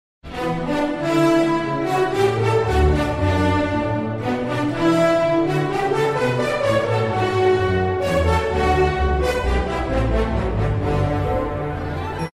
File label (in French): Ecoute globale Relevé mélodique; formation musicale fin de 2nd cycle 2017; examens 2017